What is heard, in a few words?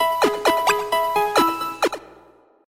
Healed